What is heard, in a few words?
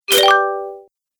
Magic Coin, cartoon; Cartoon Sound Design Sound Effects